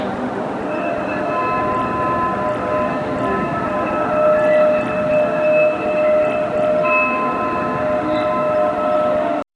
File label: large festival crowd ambi; devotional